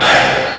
kickback

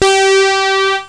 tone

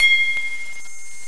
ping

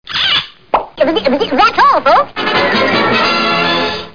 Warner

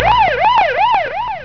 Ambulance; TinyTown

